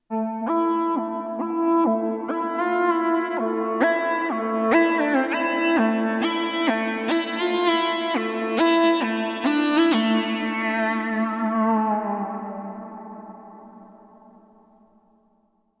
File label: russian filter solo